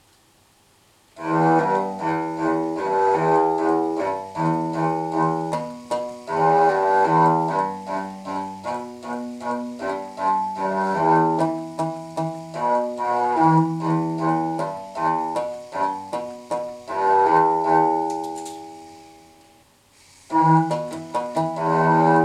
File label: string